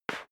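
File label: step sneaker concrete slide short, footsteps; schritte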